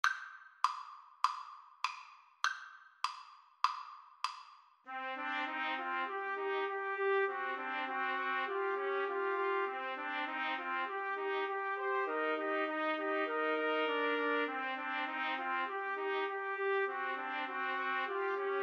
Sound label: mountain king, Trumpet Trio